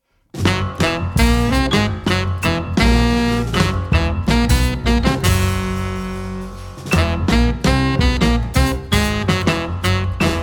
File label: JAZZ, piano, sax, drum, doublebass